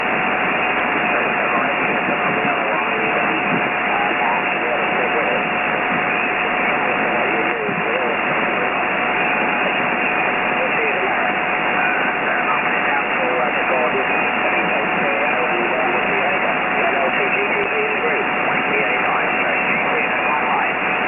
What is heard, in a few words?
40m SSB day weak